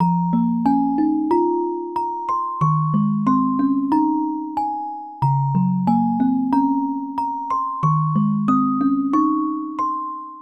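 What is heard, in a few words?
bgm music box; ゆめ2っき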